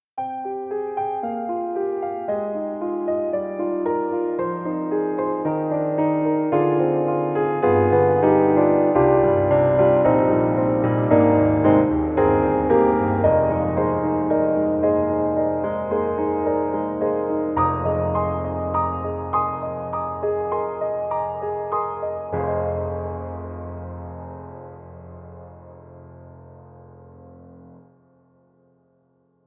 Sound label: Mini Grand; AIR Music Technology; Deep Blue Sea